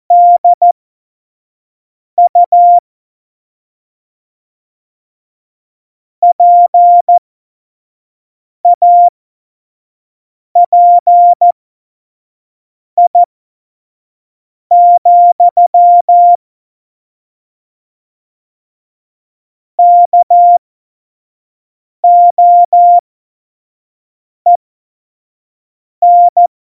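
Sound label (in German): Witz, cw morsen; Morsekurs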